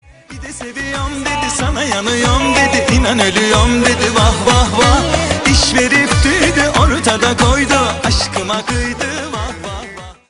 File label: twinkle music